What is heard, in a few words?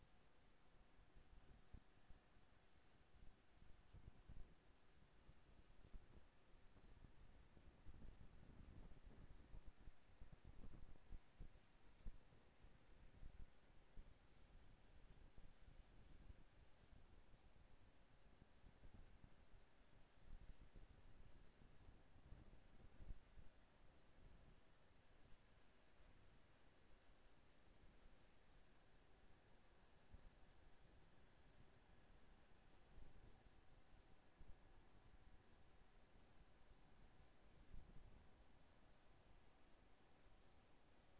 HYDROPHONE 16000Hz; JASCO AMAR HYDROPHONE 740